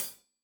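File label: hat closed, hi-hat cymbals stereo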